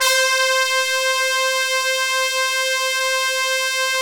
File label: BRASS, Brass Bones; Club-50 - Foundations Roland; Brs Layer